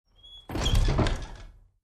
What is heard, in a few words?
Creaky Truck Door